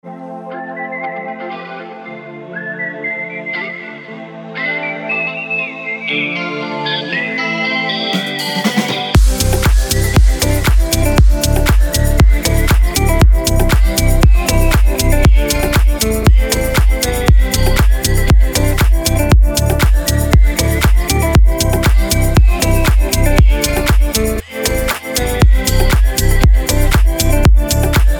remix

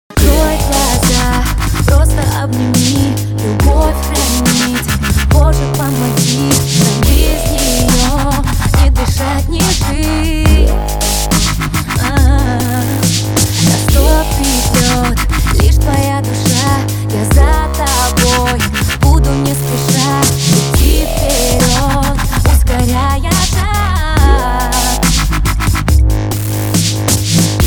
dubstep remix

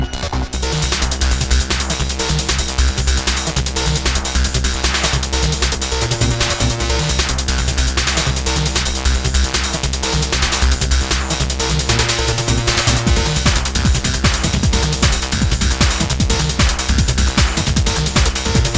Spoof Techno

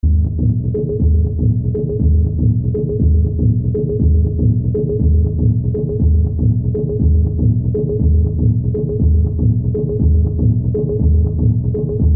drums

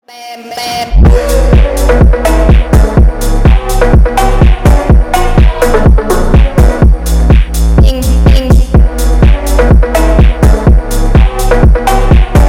crazy phonk

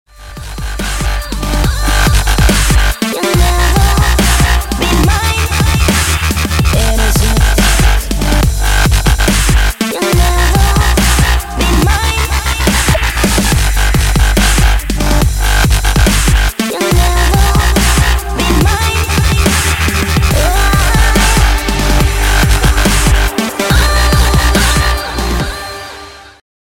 Dubstep Mix

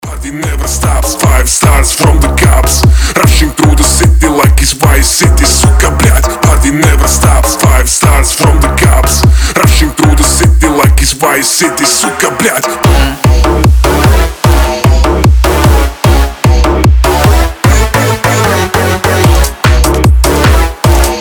hard bass

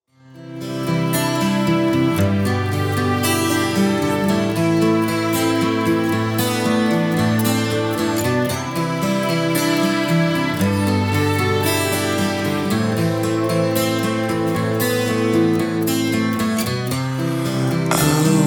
string version

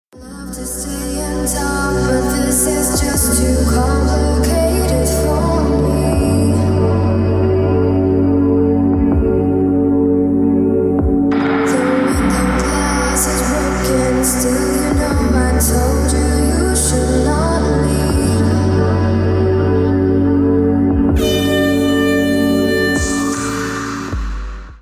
beat

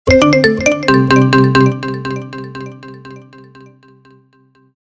marimba

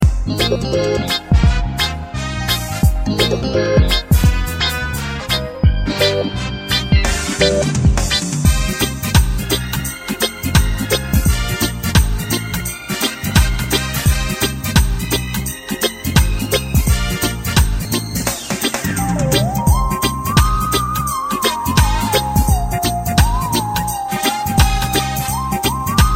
reggae

